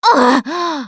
hurt minor, russian, Survivor Voice Producer

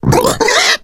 female boomer disrupt vomit; voice; Left 4 Dead 2

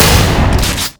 shotgun shoot; Ace of Spades

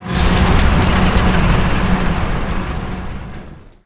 motor; mcv; Emperor - Battle for Dune

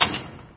handle pushbar locked, doors; Garry's Mod; hl2

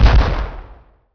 Kindjal Gun; Emperor - Battle for Dune

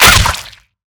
flesh impact bullet; physics; Counter-Strike Global Offensive

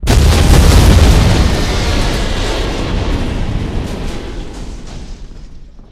gunship explode; npc; Garry's Mod; hl2; combine gunship

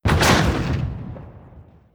heavy cruiser attack; Hearts of Iron IV Death or Dishonor

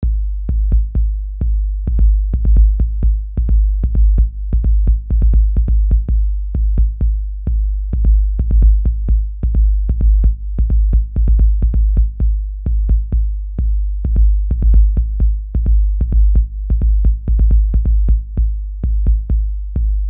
BASS

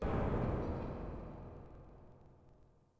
Space Crate Fall; Ambient; Sid Meier's Civilization 4 Complete; Beyond the Sword

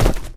jump landing zombie; Left 4 Dead 2

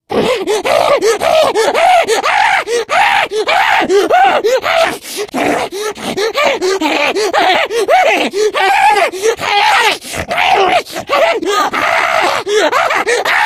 jockey attack loop, voice; Left 4 Dead 2

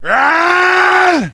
man yell; Mount&Blade Warband

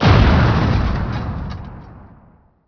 explosion vehicle; Emperor - Battle for Dune

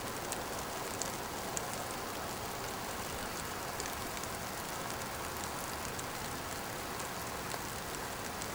rain; Mount&Blade Warband

